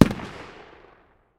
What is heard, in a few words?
dmr medium distance, 7.62x51mm; tacz-tweak-pack; taczmechanics